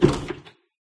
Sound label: drop furniture seating; There; ThereClient; gamekit